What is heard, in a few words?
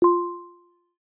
wireless charging connected, LG Stylo 4 Plus ui